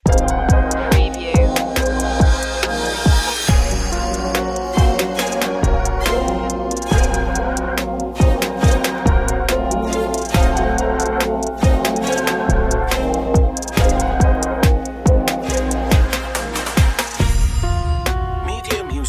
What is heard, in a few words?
smooth soul vibes no vocals